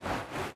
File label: swing; sky dragons fury